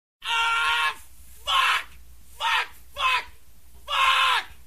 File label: aaaah